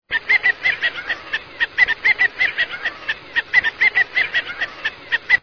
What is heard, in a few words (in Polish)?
glowienka, kaczka